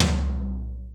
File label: TOM, SY99 KIT; Crypton - DrumBase5000